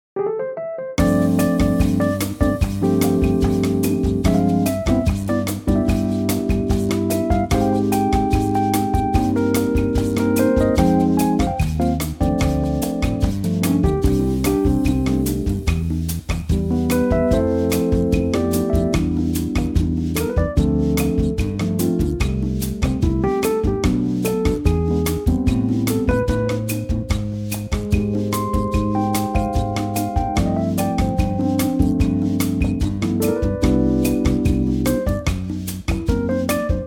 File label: C bossa